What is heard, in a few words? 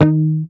MUT D#3, Bass; HAMER12MUTES